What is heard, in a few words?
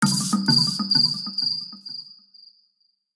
Safari instant message; Nokia Ringtones; Audio themes